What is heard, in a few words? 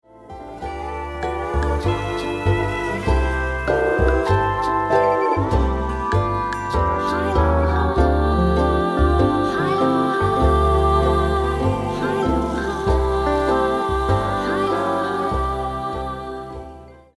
ukes sticks